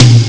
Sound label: electom; ST-XX Amiga Soundtracker Sample Packs